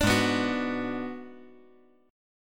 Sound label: G sharp Minor 7th Flat 5th G sharp down; G#m7b5 strummed; JGuitar